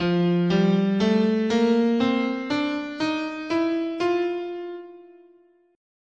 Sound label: F Bebop Dominant, scale